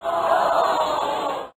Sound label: crowd sad; The Smurfs - Penalty Shout-Out; NextlevelGames